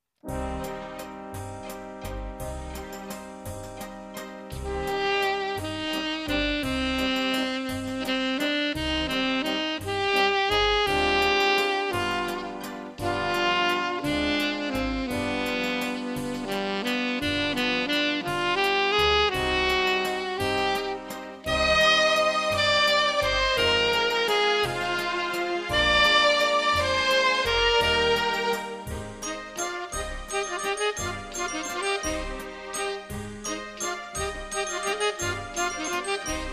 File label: waltz